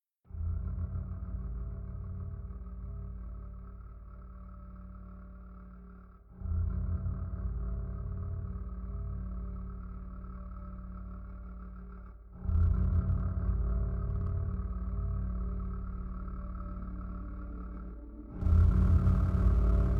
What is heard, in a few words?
Savannah Music